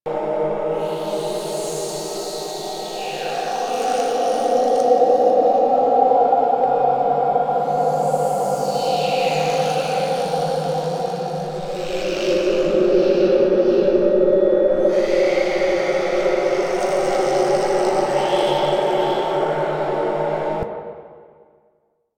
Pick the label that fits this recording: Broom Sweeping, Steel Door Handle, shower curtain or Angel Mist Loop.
Angel Mist Loop